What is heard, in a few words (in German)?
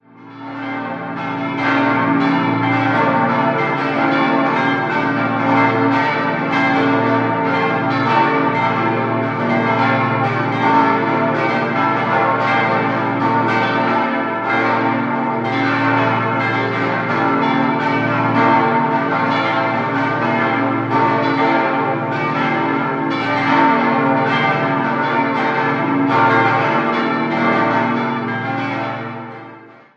Mitterteich, Glocken